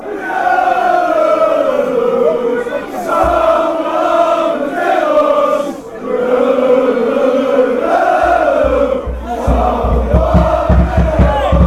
chant; heracles

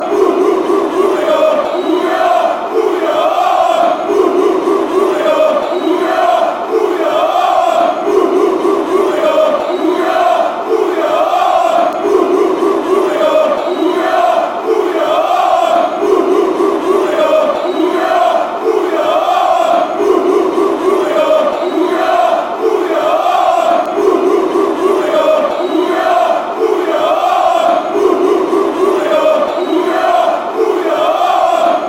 hoo hoo hoo fan chants

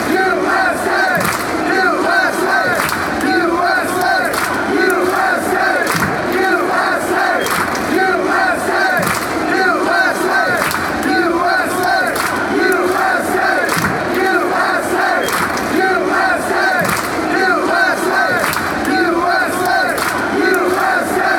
usa slow claps

